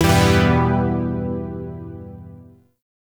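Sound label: Shawzin Major Chord; Chords; Warframe; Zariman Shawzin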